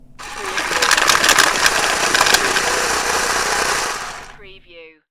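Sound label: Card Shuffler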